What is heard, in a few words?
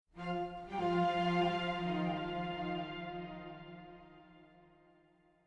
MUSIC strings; Myst III Exile